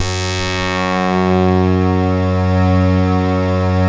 KORG DELTA, LEADS

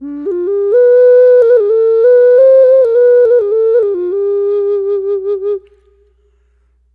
Gourd Occarina; Ambient; Clean Flutes

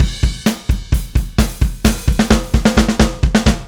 Drums Fill, Indie Rock; Sonic Foundry (Sony Creative Software); Indie Rock Anthems; Session 10 - Roswell

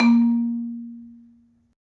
Marimba, A#1; Terkelsen

